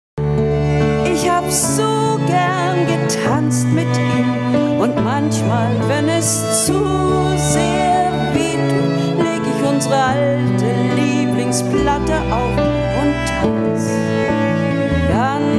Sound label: tango